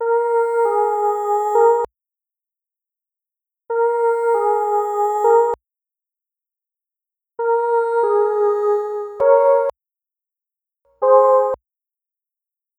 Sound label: Ahh Synth